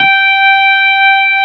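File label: Wurlitzer G4 - L; USB Soundscan vol.09; AKAI; Keyboards Old School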